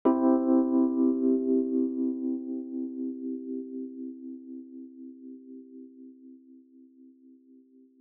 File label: tremolo